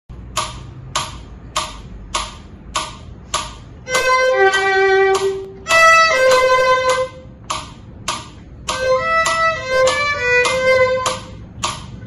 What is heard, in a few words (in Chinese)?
小提琴; 玩具交響曲; 篠崎小提琴教本 新版; 示範演奏